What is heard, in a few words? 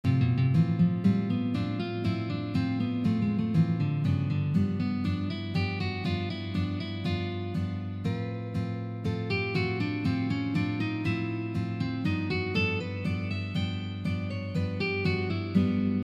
D-7 vers G7